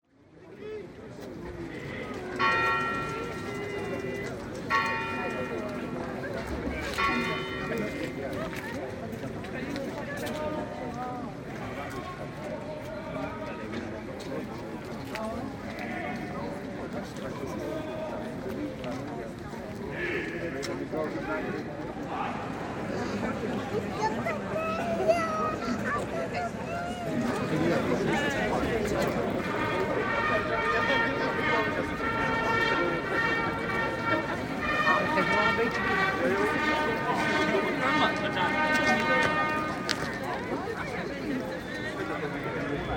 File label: Royal Palace; Field Recordings